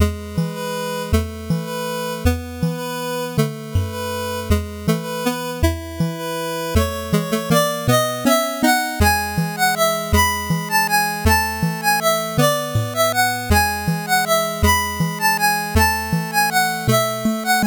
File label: 8bit bgm slow